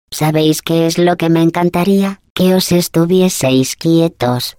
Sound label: laugh, es; GLaDOS; taunt